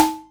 Roland MC09 Snare

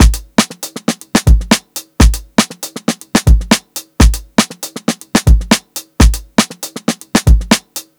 120 16TH BEAT, DRUMS; BASEMENT DRUMS VOL 2

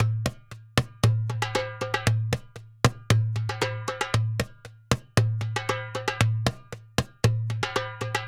Doumbek, Arabic

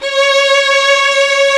violins; E-MU Formula 4000 Series Vol. 3 – Analog Odyssey; VK+ Instruments

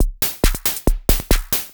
percussion loops, Techno; PS-45 WAV Techno ID

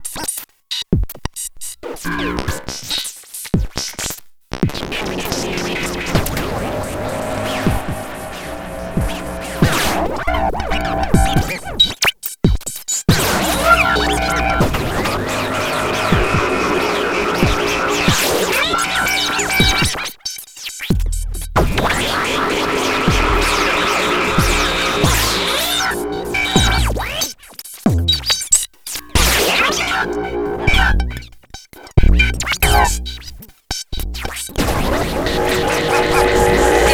Noise Patch

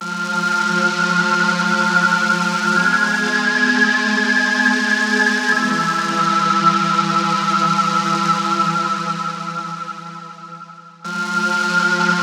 Melodics; neuro; Optiv & BTK

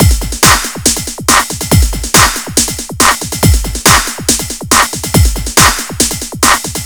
The Pounding W Clap, drums beats

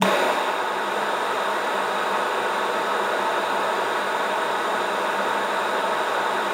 luft, alto sax; Xsample VOL-2

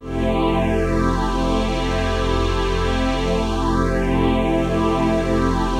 PHASE PAD

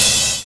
RIDE FILL; Univers Sons

